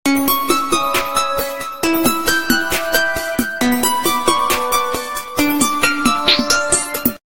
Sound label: Minor tone; Samsung SCH-W770; ringtones; Simple Tone